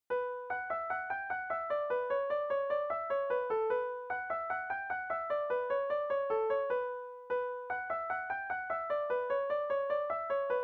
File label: Monks Cellar, slip jigs